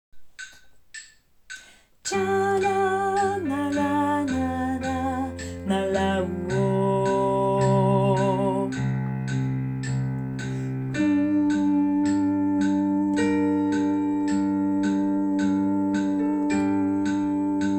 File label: alto